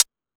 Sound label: Techno G; EMU XL-7